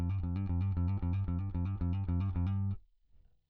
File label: bass